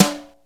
steel snare drum g key, Royality free snare single hit tuned to the G note.; snare drum samples